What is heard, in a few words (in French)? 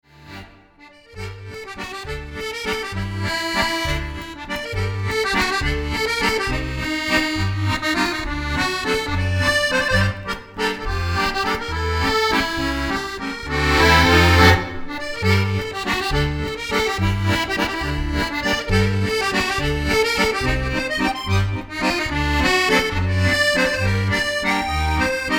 Valse